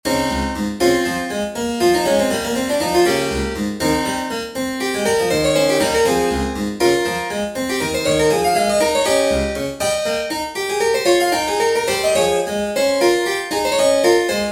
Diasem Lydian Example 26edo

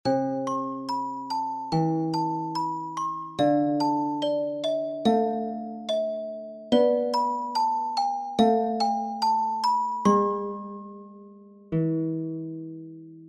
Lullaby; Porcupine

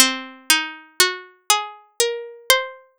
5ado scale

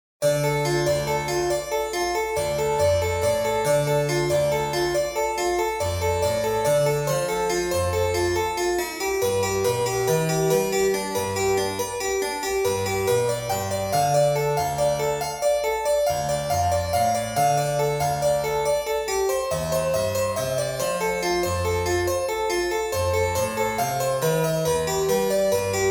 22edo Prelude; Porcupine Prelude 1

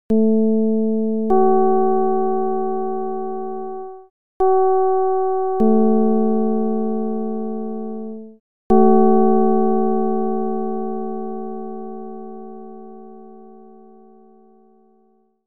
csound foscil 220hz

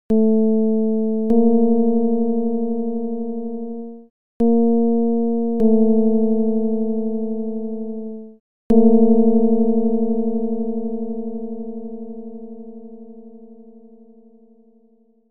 csound foscil 220hz

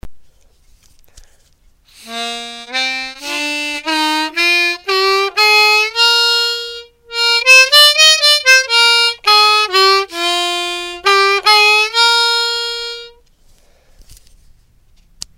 Harmonica; Jiharkah

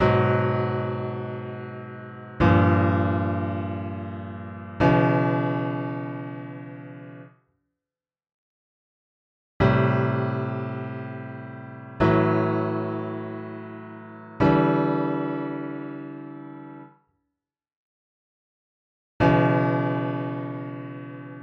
16NEJI128 Serialism Chords

